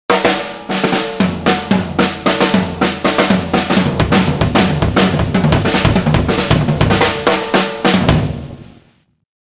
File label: drum solo